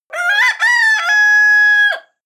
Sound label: cock call close